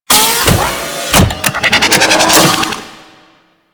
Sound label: Generator Open; Warframe; Ambience; Tenno